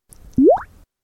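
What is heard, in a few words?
plop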